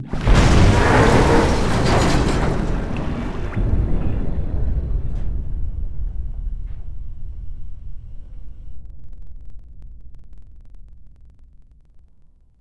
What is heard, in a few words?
Submarine Die; Civ4